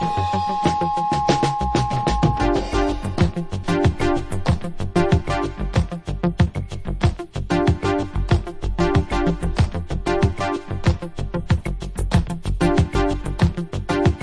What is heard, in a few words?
organ-grinders breakdown